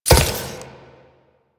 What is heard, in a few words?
Moa Step; Warframe; Quests; The New War; Veso